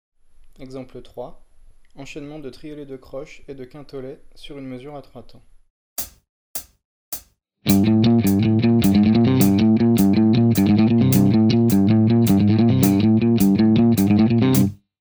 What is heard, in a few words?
odd Grouping